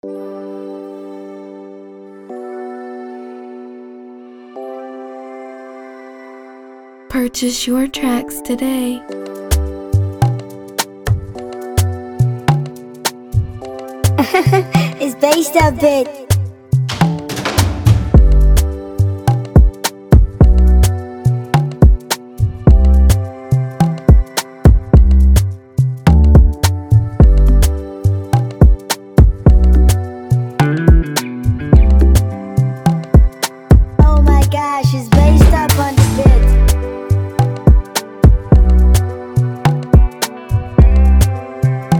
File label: Free beat 106Bpm G major